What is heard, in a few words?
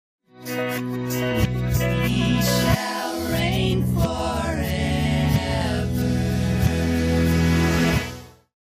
reverse; backmasking contrario